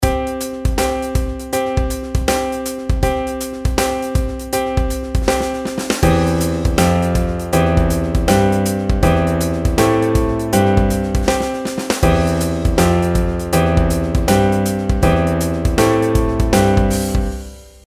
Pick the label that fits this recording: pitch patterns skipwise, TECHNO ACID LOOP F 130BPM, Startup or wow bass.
pitch patterns skipwise